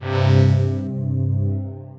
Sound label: Slow Brass (ModW), P-50 Linear; Ableton; Factory Packs; Retro Synths